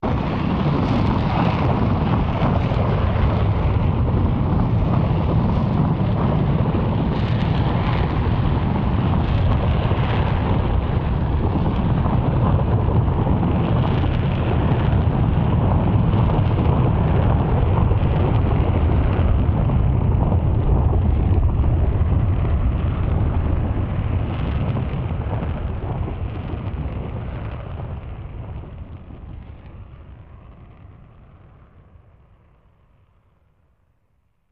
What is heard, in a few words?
Rocket Take Off Away